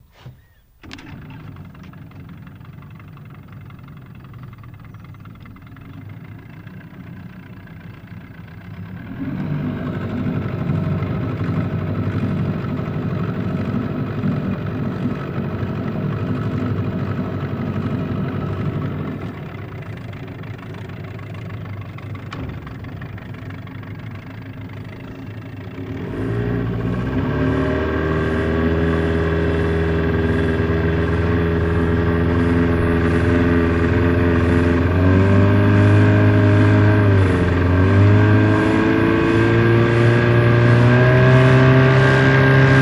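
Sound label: Motorboat Start Idles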